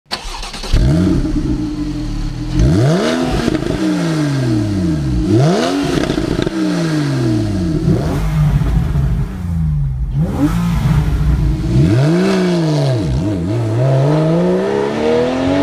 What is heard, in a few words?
Porsche 911 Targa 4 GTS